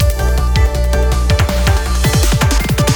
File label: Snow Transition; sky rogue